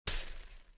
rain fizz; weapons

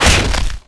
skewer hit; weapons; galak

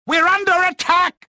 anger

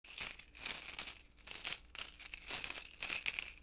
coins, SHOP; AMBIENT; Pirates Of The Caribbean

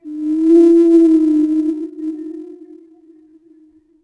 Call, Dino

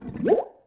water bubble; Tony Hawk's Project 8; XBOX

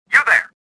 anger; worker3

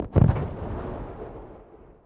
distant explo; impacts explos

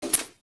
Electric Off; Max Payne

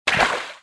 water walk, footsteps

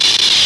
welding short; electrical sparks

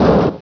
dirt; World Racing 2

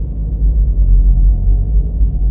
interior, ambience; cairn

